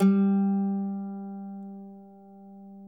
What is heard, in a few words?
MNDLN G2; Sonic Reality; American Heartland